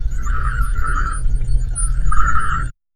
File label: soft wind chime faint; wind chimes